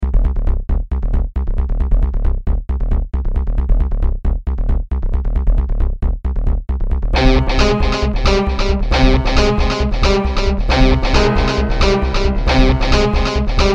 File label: dark patrol